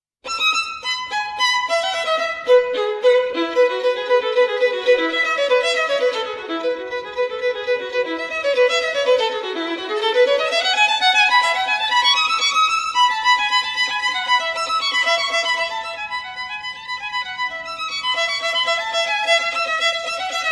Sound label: Violin